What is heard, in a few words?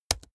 keyboard laptop space press fast hard